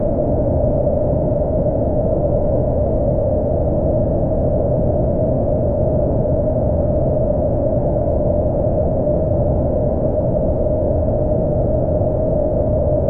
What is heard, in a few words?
rocky landscape planet